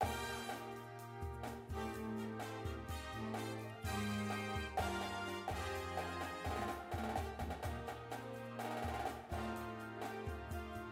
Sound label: snare w music